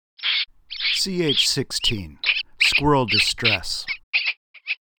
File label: Squirrel Distress, grey squirrel distress